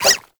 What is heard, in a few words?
Shrink Baby Jump; Warframe; Nokko